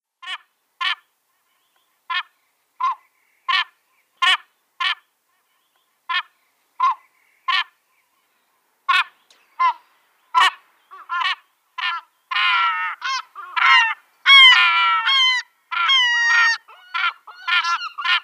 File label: Glaucous Gull